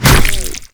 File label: Infested Ancient Tentacle Swing Hit; Warframe